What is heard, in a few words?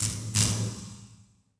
Zap, electrical sparks